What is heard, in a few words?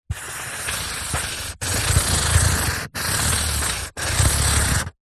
office marker pen long strokes on cardboard sheet